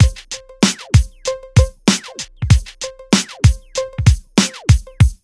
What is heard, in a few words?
BEAT, Hip Hop R n B; USB Soundscan vol.19; AKAI